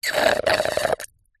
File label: food drink straw suck last dregs drink slurp; zapsplat